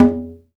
PRC CNGA, Congas; Roland - Rhythm Section; Latin 1

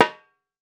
REPIQUE, Latin Drums; Latin Drums & Rain

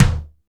KIK TOMTO, Dance Kicks; Roland L-CDX-01

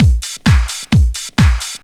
drum 130 trance

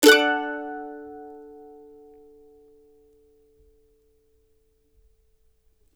CEE CHORDS D, Blues Guitar

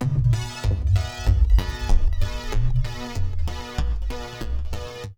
Synth Pulse + Tabla C 120

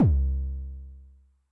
Bass Drum Pitch Med, 909; classic machines; 909mod

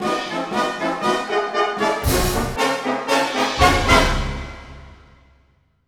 Orchestral Loops; 128 VERTIGO

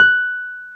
PNO Wurly, F# 6; Keyboards of The 60's and 70's

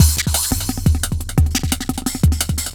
Beat, Drum N Bass

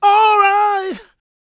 GOSP MALE, Vocal Gospel; Vocal Planet Vol1 Gospel

